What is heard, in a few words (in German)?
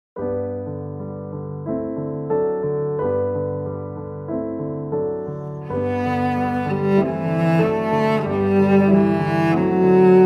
instrumental mit aussprachehilfen; Spi ditja mojo usni; Russland